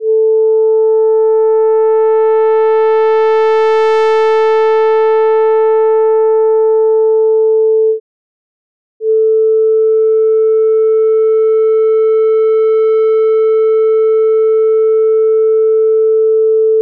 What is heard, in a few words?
feedback